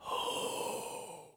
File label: Zombie Death; Hollow Knight